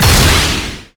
Jet Active Shot; gamerip; Se Enemy Jet Active Shot

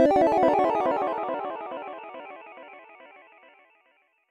Charmed Enemy

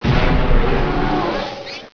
Vtb Die; Virus: The Game

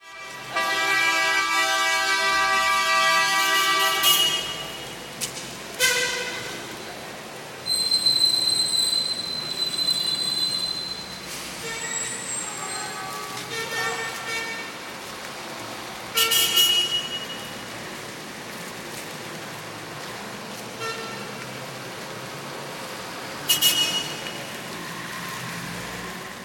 Hongkong Traffic

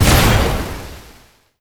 Enemy Boss Common Strong attack Hit; disney star smash; android; Enemy Bigbumpo Active Hit

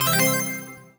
collect item jingle; Strike Force Heroes; Xbox Series X/S

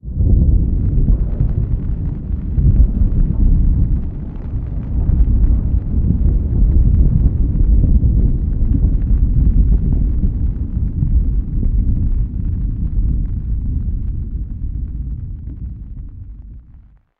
Earthquake Medium

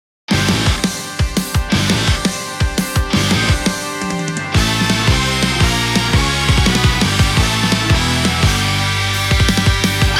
BGM BATTLE

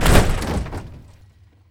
Impact Suspension Low

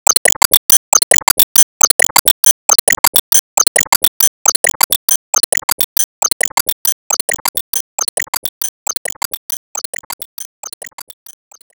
Foot Woman Loop

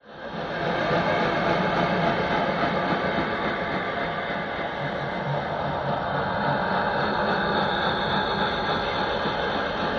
Spooky Sounds

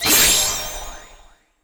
Gauge Max

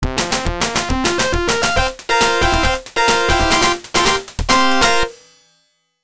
Game Over; Wrestle War; System 16B

